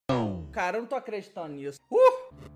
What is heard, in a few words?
uuuh; davy jones